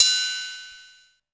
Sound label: AX MIX RID; AKAI S6000 CD-ROM - Volume 1; DRUM RYTHM